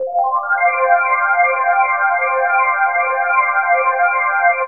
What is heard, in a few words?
odd c, Weird Loops, Chillout; Best Service ProSamples vol.36